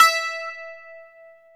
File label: BGLM E 4, BAGLAMAS; USB Soundscan vol.53; Mediteranean Traditions; AKAI